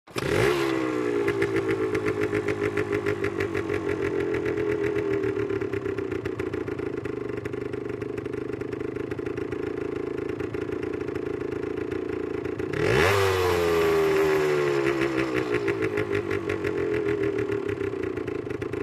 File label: Motorcycle starts, regassing, jamming